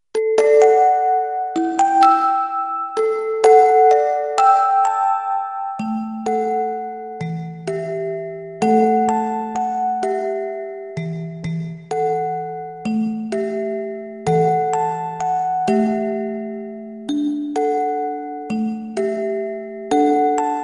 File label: berceuse music box